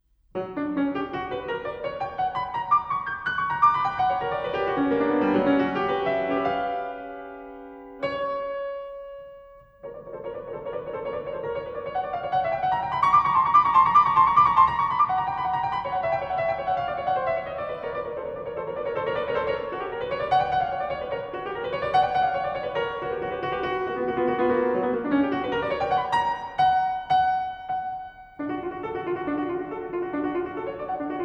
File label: toccata